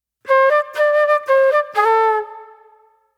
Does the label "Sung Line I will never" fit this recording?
No